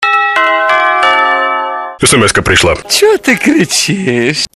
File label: sms sound small